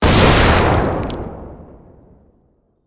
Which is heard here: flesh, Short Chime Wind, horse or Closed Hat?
flesh